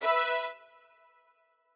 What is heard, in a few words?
strings